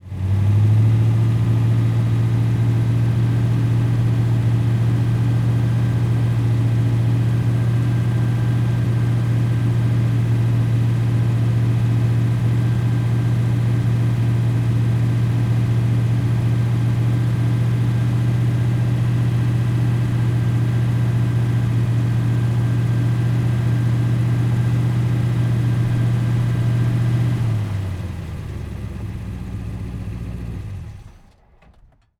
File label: BigBlock, Corvette, 1967, engine, partial throttling, hold, release, cut; 6ft height; ORTF; Eiravaein Sound